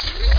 DOOR; PC Gamer IT CD 32; XCOM; Gadgets

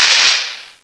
Elec; 3D Ultra Pinball Demo; Pinball Demo Music

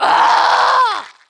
female die; DAoC; Toa; Gamestar

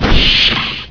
arm Swap; PC Gamer IT CD 48; Big bang

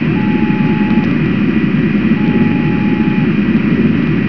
cockpit; doom

